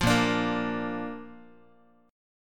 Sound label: A sharp Major, Guitar; strummed; JGuitar